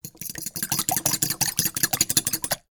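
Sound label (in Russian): размешивая звук яиц вилкой на кухне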